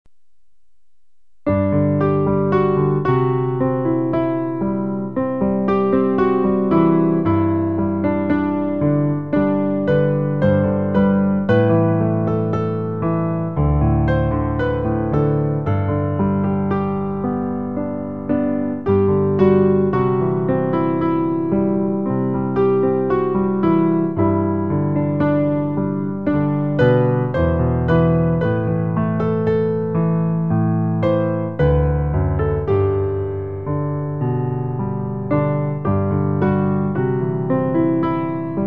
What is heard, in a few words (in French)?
piano; Te ressembler, Jésus; Pour accompagner le recueil Louez Dieu.